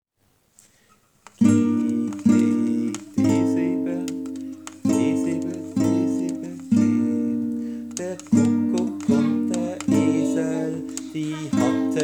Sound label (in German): Kuckuck; Lieder in DUR; 2 Akkorde